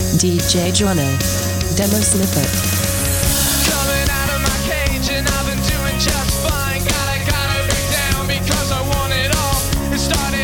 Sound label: Db 148bpm; REDRUM